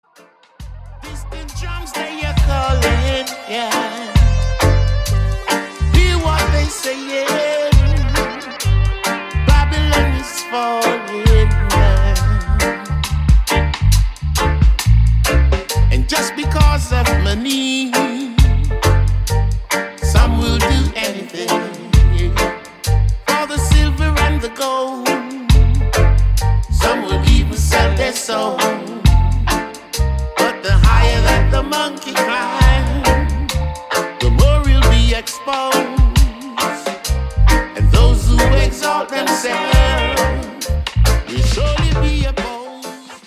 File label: Distant Drums